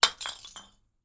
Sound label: impacts glass bottle smash; martinimeniscus